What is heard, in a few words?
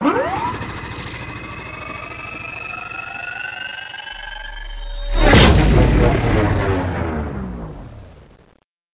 Sound Lock And Fire; Learn to Fly 3